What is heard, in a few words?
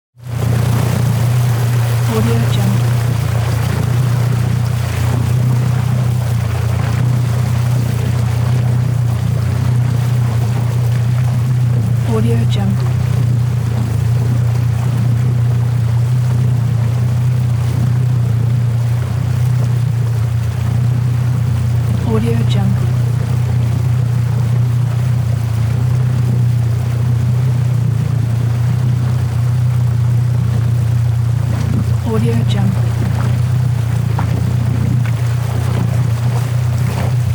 boat moving slowly engine perspective